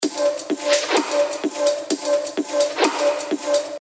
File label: 128 BPM; Eyes